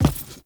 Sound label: Crew Footstep Default; Warframe; Characters; Railjack Crew; Steel Meridian